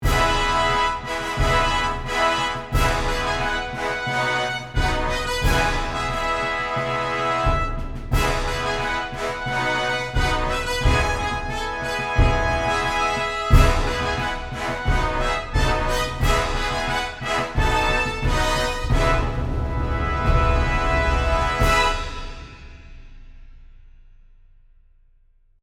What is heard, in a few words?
Fanfare